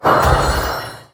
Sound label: Spell Small Positive